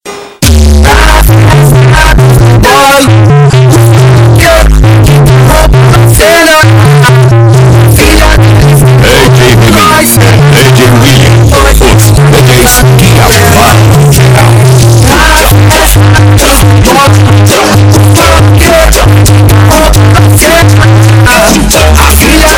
funk altomotivo, Músicas Com Graves

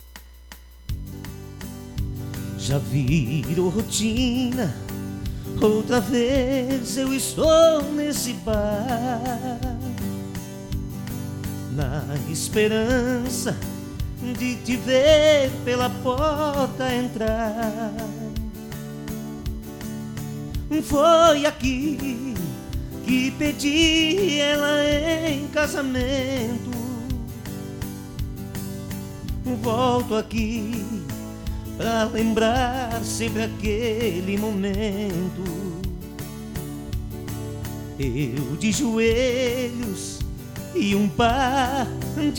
valsa

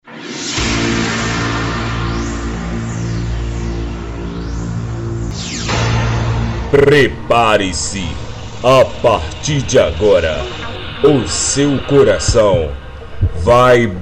vinheta banda; abertura

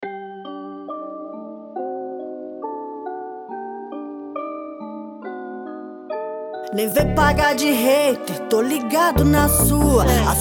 mais braba rap trap

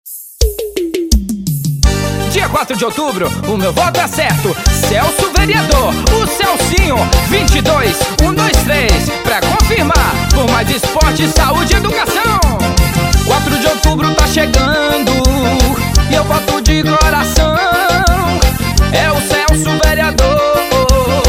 jingles politicos pizeiro

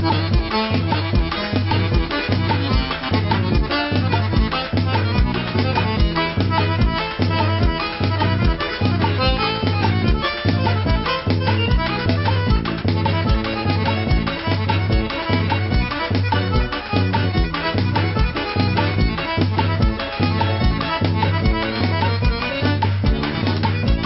acordeon

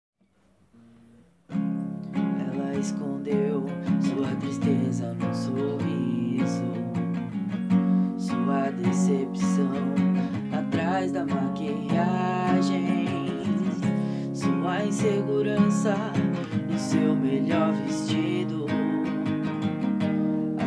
voz e violao versao caseira

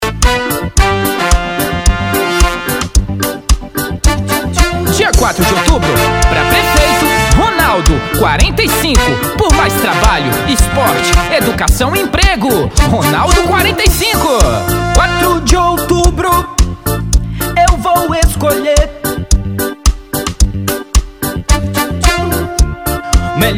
jingles politicos, reggae; Jingles Politicos 2020; STUDIO LAKO

